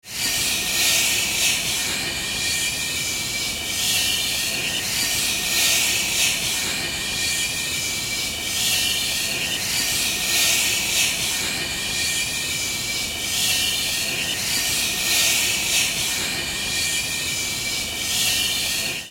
climb down metal start

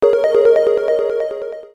event mild big jingle

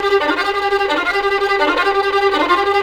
violin slayer, fiddle; music; szf; zombat

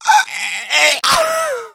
death, infected; npc; die; szf

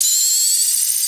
hunter flechette pre explode; grayworker

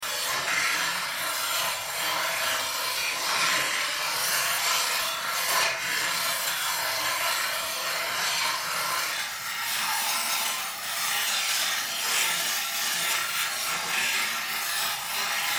rail grind

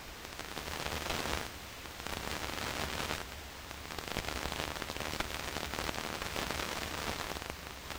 static light

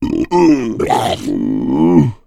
male boomer lurk, voice, idle; szf; player

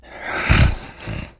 idle breath, infected breathing; npc; szf

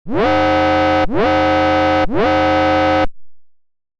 wave 5 boss opening; outbacksounds

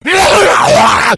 rage at victim, infected; npc; szf

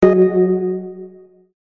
event mild jingle

